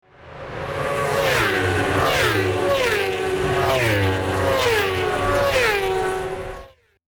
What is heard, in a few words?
Racing SE